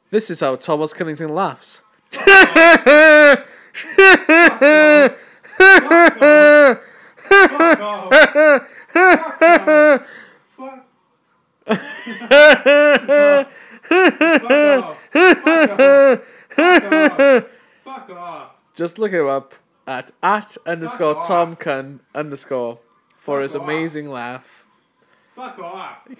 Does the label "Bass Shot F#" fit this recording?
No